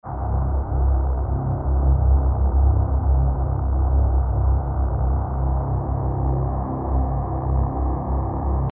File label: Drone Dangerous C; Aftermath